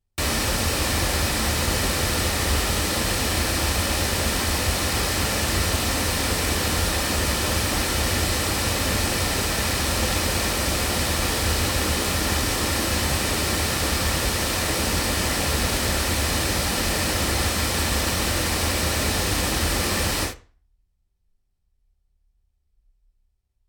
Oktava MK219 loudspeakers

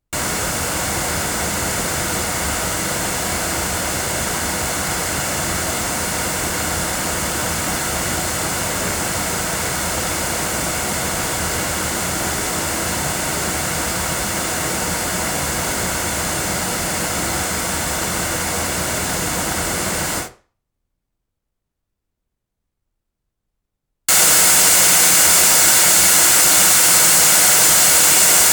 Yeti Pro omni loudspeakers; BLUE

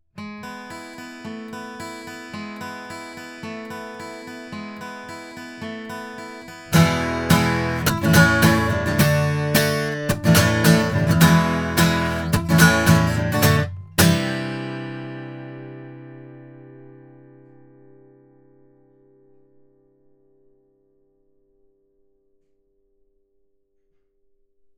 guitar; BLUE Babybottle

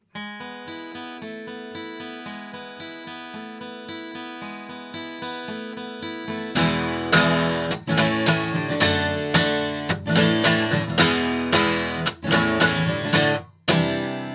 guitar, microphones, Milab LC25

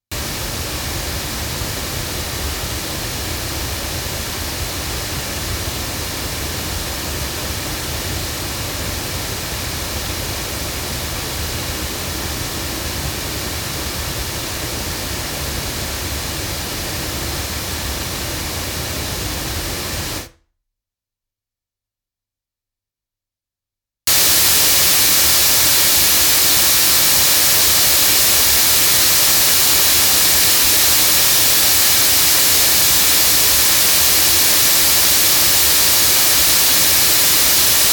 loudspeakers; GoldenAge FC3; microphones

